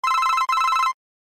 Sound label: Alert Motorola